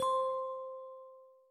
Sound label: messenger chime